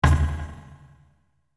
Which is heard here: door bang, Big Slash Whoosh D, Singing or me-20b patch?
door bang